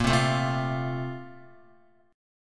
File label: A sharp Major 7th Suspended 2nd, Guitar; A#M7sus2 strummed; JGuitar